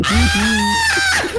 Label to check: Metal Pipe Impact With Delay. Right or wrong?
Wrong